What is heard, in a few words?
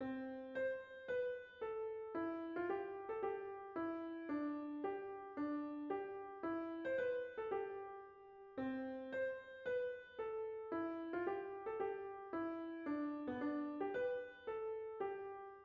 Easy Piano; songs for kids